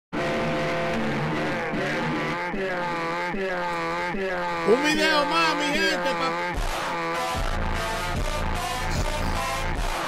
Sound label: montagem slowed reverb